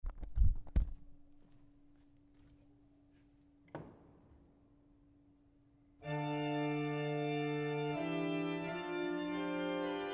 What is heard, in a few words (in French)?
Grand plein jeu orgue; Boyvin; 1er livre